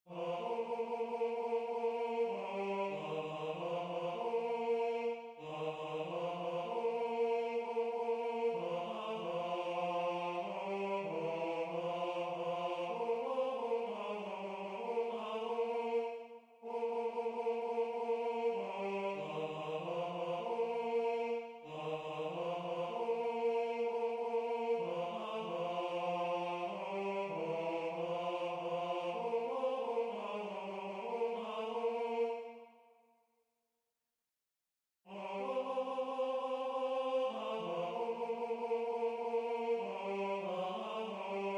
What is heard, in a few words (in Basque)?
Baritono; Denbora Pasa; MATSAREN ORPOTIK; J. Guridi